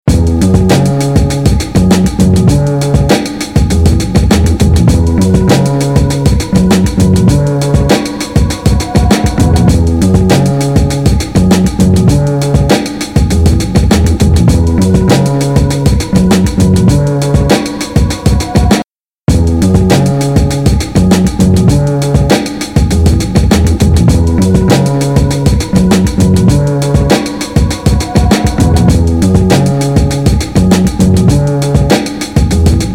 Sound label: Bass Beat